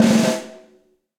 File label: Snare Press Low Loud Squashed; Snare Articulation; Drum Hits; Session Drums Club; Standard Hits; Ableton